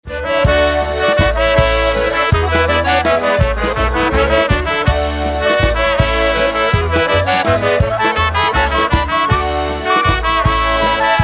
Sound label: Waltz